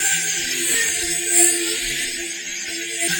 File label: Magnetosphere, Tonal Atmospheres; Sonic Foundry (Sony Creative Software); Myths of Technology